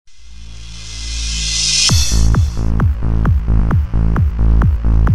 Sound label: instrumental radio version